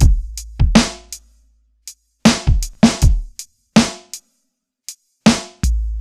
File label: downtempo, Boombap drum loops